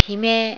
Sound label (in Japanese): himei